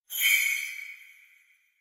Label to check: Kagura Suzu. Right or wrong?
Right